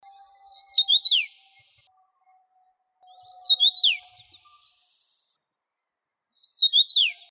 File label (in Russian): chechevica